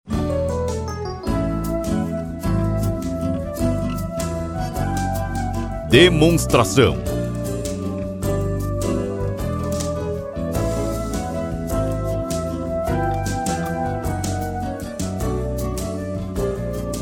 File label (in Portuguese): Trilha